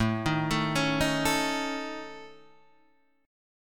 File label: A Major Flat 5th, Guitar DADGAD